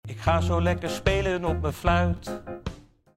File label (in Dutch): spelen op mijn fluit